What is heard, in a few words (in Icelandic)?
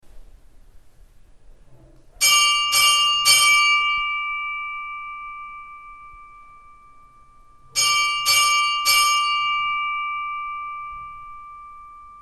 modrudalskirkja minni, kirkjuklukkur